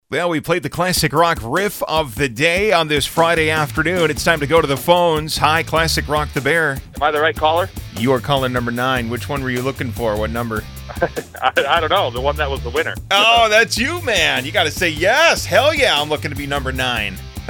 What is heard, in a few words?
Riff